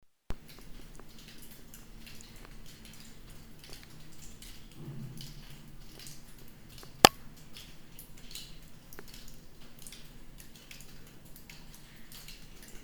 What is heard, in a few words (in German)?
Im Tunnel